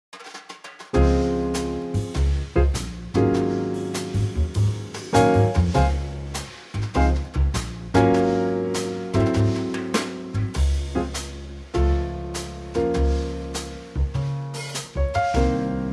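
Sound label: Db Trio, Backing Tracks for Male Vocals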